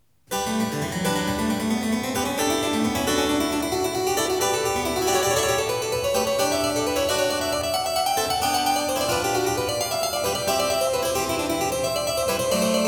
Variatio 26 a 2 Clav.; BACH; GOLDBERG VARIATIONS

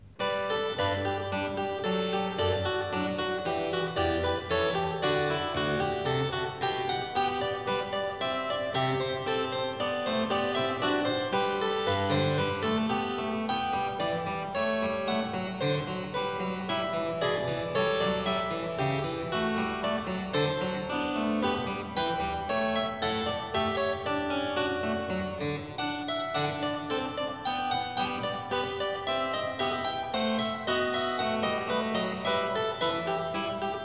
Variatio 19 a 1 Clav.; BACH; GOLDBERG VARIATIONS

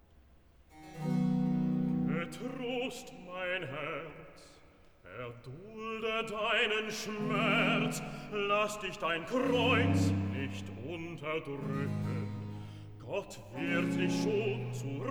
Recitativo Bass; Getrost! mein Herz; Bach; cantatas; Schau, lieber Gott, wie meine Feind

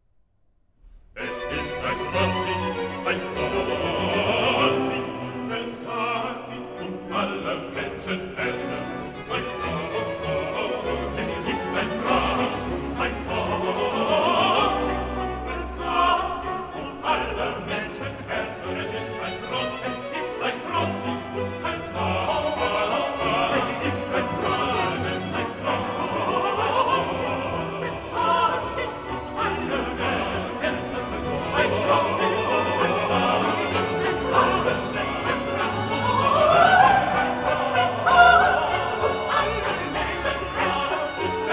Coro; Bach; cantatas; Es ist ein trotzig und verzagt Ding

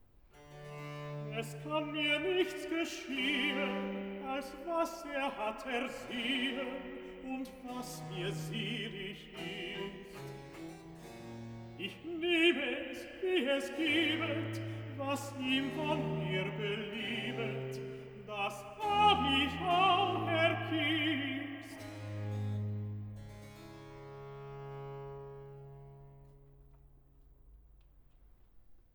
Recitativo Tenor; Versus III; Es kann mir nichts geschehen; Bach; Cantatas; BWV 97

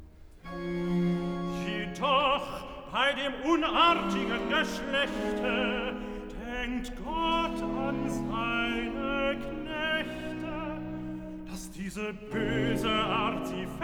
Recitativo (Tenor); Jedoch be dem unartigen Geschlechte; Bach; Cantatas; BWV 70; Wachet! betet! betet! wachet!

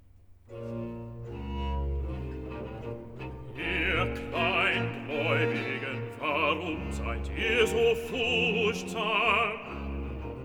Arioso (Bass); Bach; cantatas; BWV081; Jesus schlaft, was soll ich hoffen

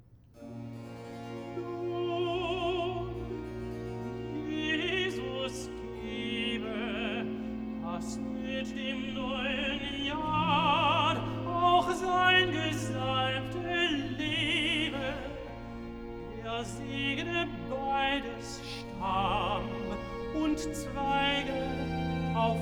Recitativo (Tenor); Bach; Cantatas; BWV190; Singet dem Herrn ein neues Lied!; Nun Jesus gebe

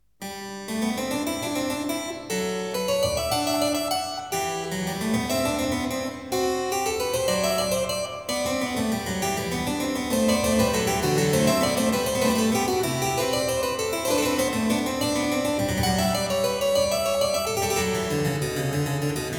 Variatio 27 Canone all Nona a 2 Clav.; BACH; GOLDBERG VARIATIONS

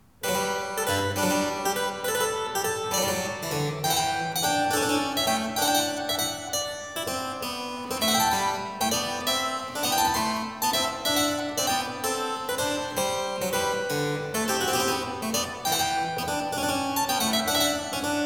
Variatio 7 a 1 ovvero 2 Clav. Al tempo di Giga; BACH; GOLDBERG VARIATIONS

